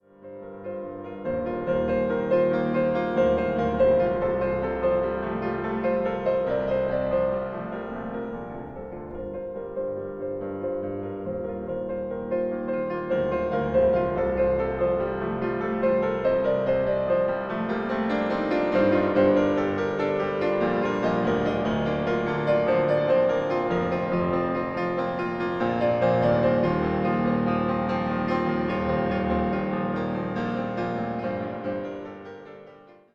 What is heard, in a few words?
dawn music